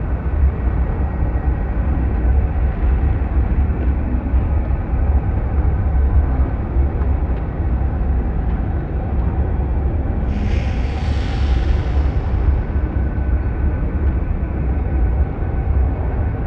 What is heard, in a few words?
machine empire station hum; Stellaris; synthetic dawn